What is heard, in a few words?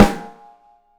OPEN BRASS, 3D Drums; 3D Sound